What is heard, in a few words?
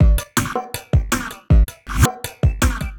drum, 160